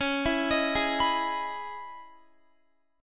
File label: C sharp Minor 9th C sharp Guitar; C#m9; arpeggiated